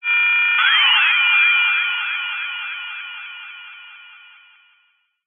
science fiction radio receiver transmission interference burst harsh incoming; message; zapsplat